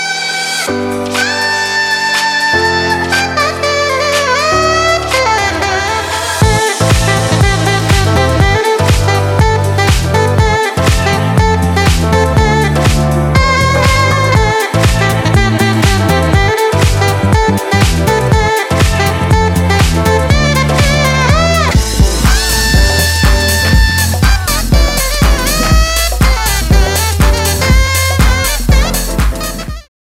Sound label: sax